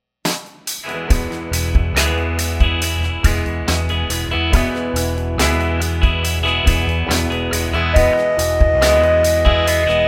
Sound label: scale degree